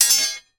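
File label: warfare sword hit another fight; zapsplat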